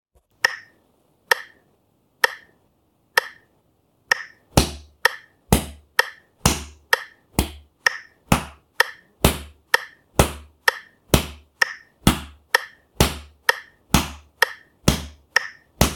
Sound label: back beat